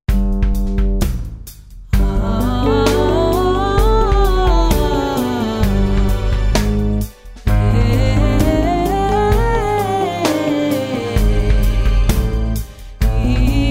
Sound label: Lydian mode